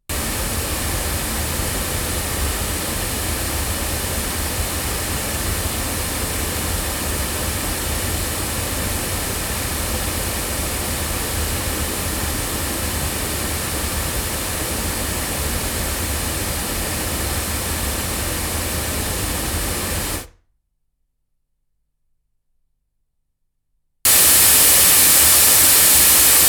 Sontronics Helios cardioid loudspeakers, microphones recordings